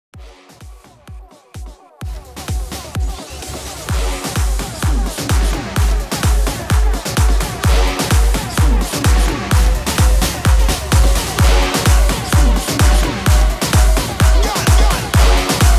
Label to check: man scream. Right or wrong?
Wrong